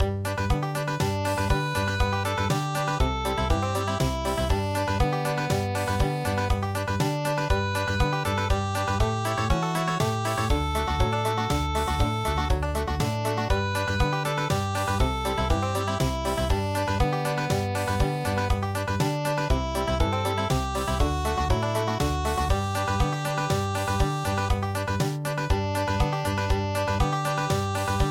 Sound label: cowboys dream country